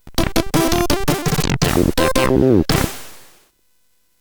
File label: Score, CSG8580